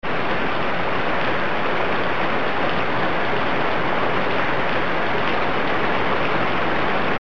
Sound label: Heavy rain